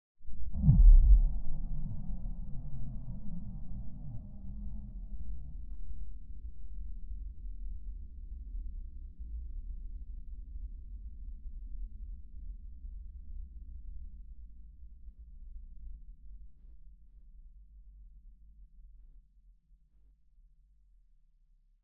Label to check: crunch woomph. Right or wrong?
Right